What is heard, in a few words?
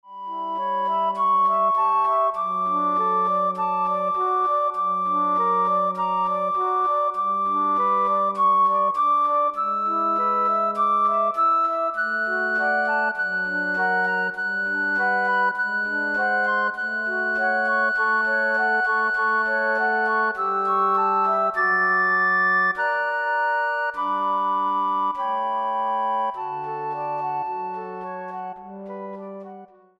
Flute Ensemble